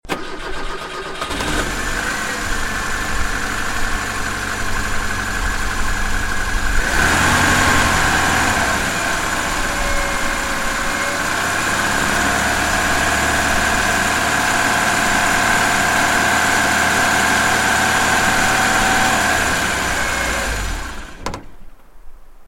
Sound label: Lada